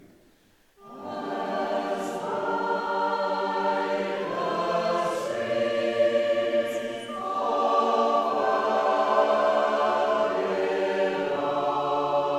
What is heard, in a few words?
Babylon Streams, Trinity College Chapel; Oxford Psalmody